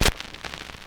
Stylus Noise, Vinyl Noise

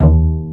pizzi total, stringed; East West; Global Sounds

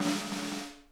snare roll, drum kits; Sonic Reality; Interactive Drum Kits

